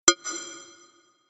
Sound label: HIT